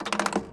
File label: Menu Pop Up; gamerip 2001; windows xp; daVinci